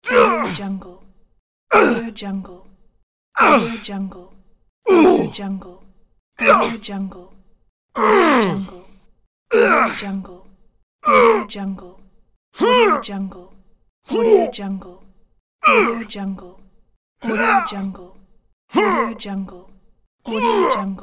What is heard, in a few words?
hurt grunt pack